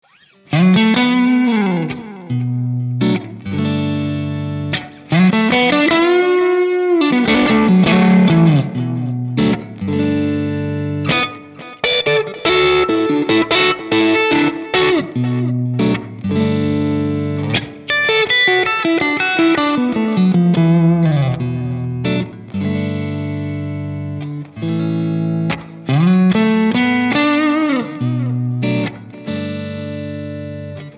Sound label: fingerpick